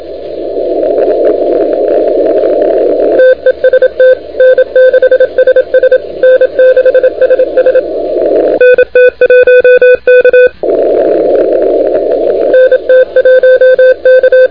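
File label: continuous wave 28MHz; ARRL10M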